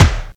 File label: rich top end bass drum single shot D# key, Royality free steel kick drum sound